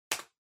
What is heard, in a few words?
PC Keyboard Far Enter Hard